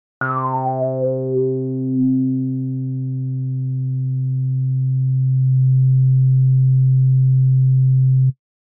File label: sweep osc b; moog